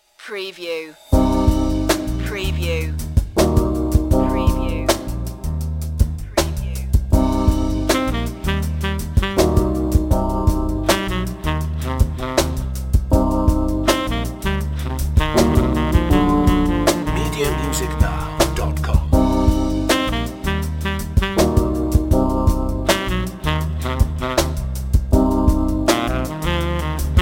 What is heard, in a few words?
jazzy chill sax